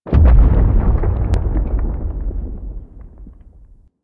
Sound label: Rock Fall Distant; Warframe; The New War; Lua War Room